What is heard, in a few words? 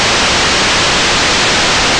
tv test pattern static